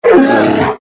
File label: bear hit